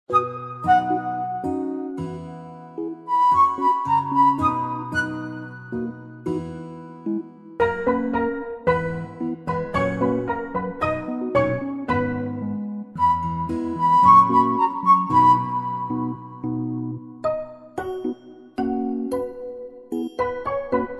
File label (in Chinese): 游戏内音乐; ArrangeTheShelves